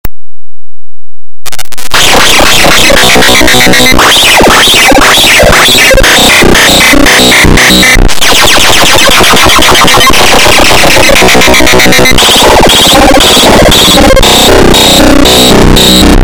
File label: bass boosted